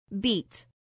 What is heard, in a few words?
beat